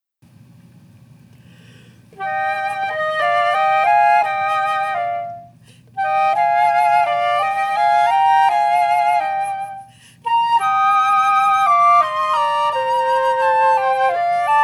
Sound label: duet andantino mozart, duets for winds; compatible duets for winds by clark